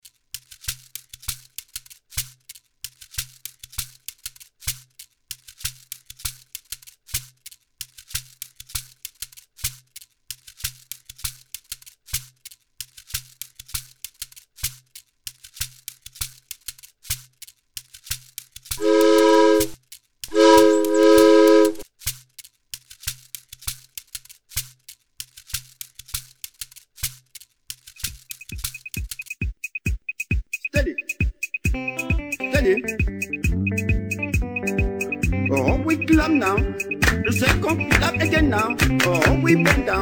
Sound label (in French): SANS VOIX